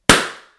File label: Balloon Pop One